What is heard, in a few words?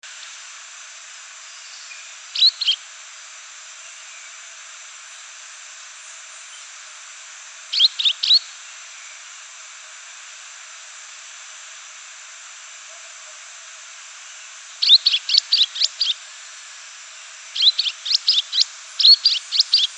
Stelgidopteryx ruficollis uropygialis Golondrina Gorgirrufa